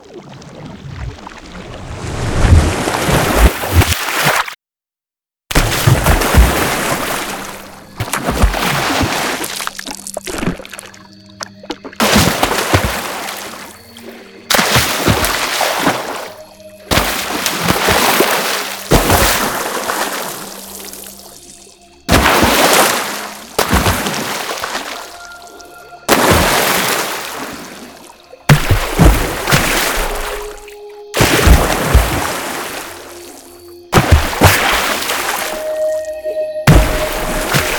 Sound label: Water Splashes; SFXTools